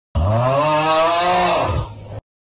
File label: aaauuuggghhh